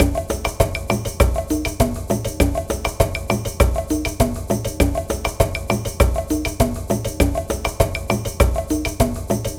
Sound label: Cave Ceremony, Latin Percussion; Sonic Foundry (Sony Creative Software); Joe Vitale